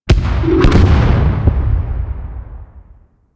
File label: Enemy Ship Destroyed Explosion; Warframe; Vehicles; RailJack; Corpus